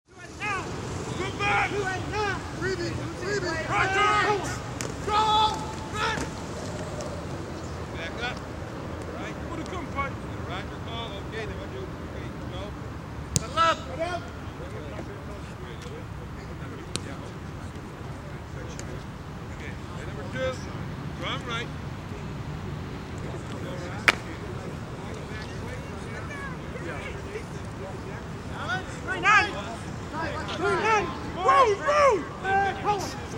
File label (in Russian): amerikanskij futbol trenirovka